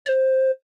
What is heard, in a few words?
Pan Pipes